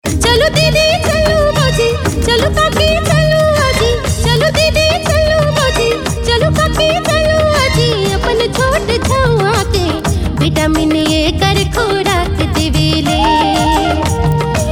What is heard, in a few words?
JINGLE NAGPURI; UNICEF